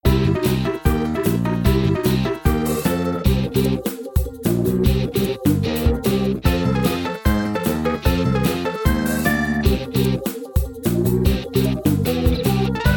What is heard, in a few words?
music; The Loud House - Extreme Cardboard Racing; NextlevelGames